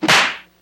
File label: slap; Crash Bang Boom